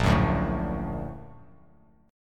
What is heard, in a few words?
A sharp Augmented Major 7th A sharp Bass Standard; A#+M7 strummed; JGuitar